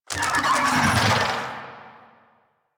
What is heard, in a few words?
Infested Big Ashen Vocal Attack Death; Warframe